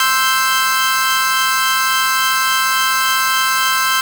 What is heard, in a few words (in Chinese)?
Absynth C5、G5、E5的锯齿波和声; 不恰当的和声